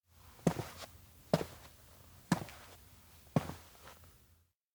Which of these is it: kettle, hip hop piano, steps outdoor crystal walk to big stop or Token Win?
steps outdoor crystal walk to big stop